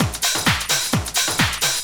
drum 130, techno trance drumloops

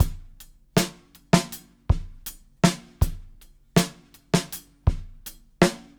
Live Beats, R&B Drums

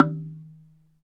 Slit Drums, Africa, Wood & Metal; Roland LCDP11 Africa VOL-1

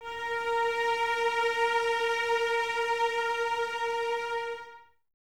CES A#4, String Ensembles Cellos; Miroslav Vitous; 90 s Sample CDs

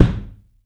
ROOM KICK; 3D Drums

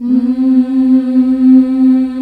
FEMALE HUM C3

